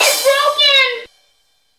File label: soft hitfinish; osu!